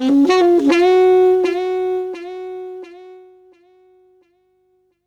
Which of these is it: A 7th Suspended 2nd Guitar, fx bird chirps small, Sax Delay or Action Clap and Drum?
Sax Delay